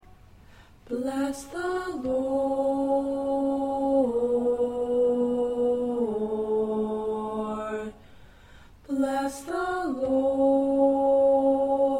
Tenor; O Come All Ye Faithful

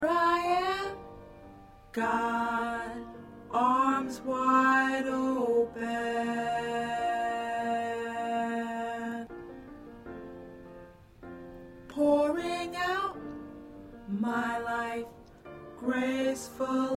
Tenor

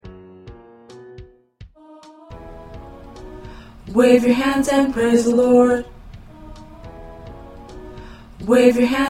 Tenor

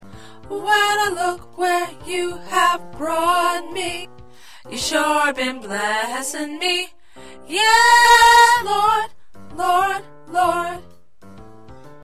Alto, choir

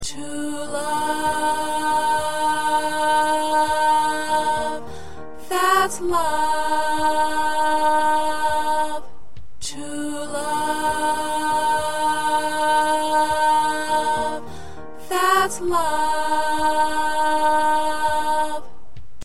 2nd Alto, choir

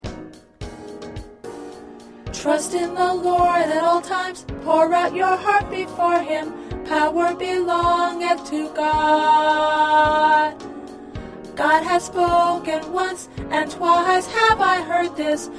Alto

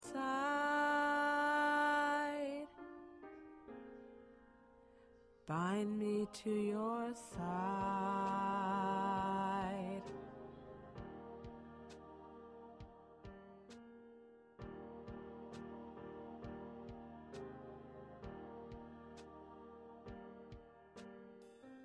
Main Lead

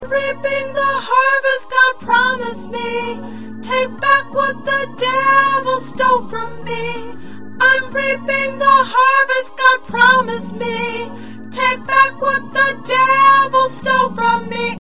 Soprano

